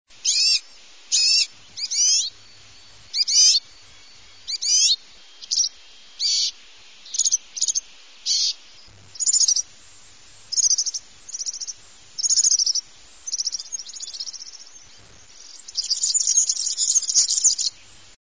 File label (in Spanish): canto verdecillo; aves campo